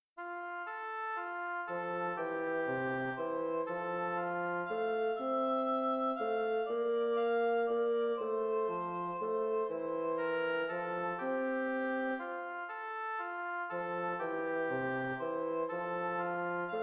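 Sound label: allegretto oboe bassoon; witthauer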